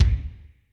ROOM K, ROOM KITS; Crypton - DrumBase5000

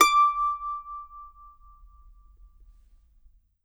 MANDO NOTES, D6; Sampleheads; Whole Lotta Country 1 & 2

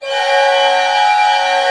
cluster, Orchestra; Sound On Sound - Peter Siedleczek's Orchestra

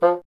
WND BSN, Bassoon

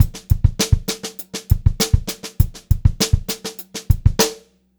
VAR, Funk-Groove Drumloops; USB Soundscan vol.38; AKAI

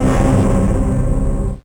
orchestra, Fairlight, c2; ProSamples vol.55 - Retro Sampler

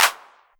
808 Clap; Drums

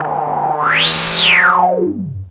NOIZ RISE; WATERFN

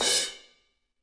CRSH, CRASHES

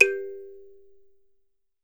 THUMB PI, Kalimbas

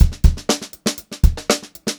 119 groovy beat 1, funk groove drumloops; USB Soundscan vol.38; AKAI

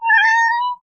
Kitten, cartoon Foley Fx; musicradar; cartoon caper

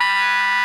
Soprano B 4, Saxophones; Greytsounds; Philip Wolfe David Crozier's Saxophones

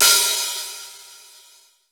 Folk Open HiHat

